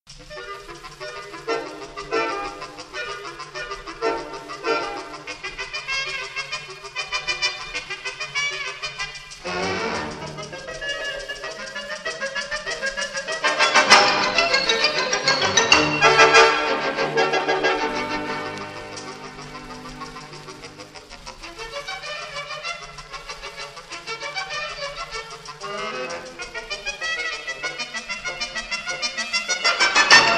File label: GUARACHA